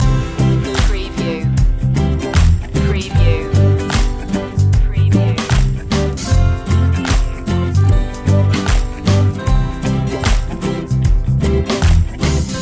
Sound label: reggae roots loop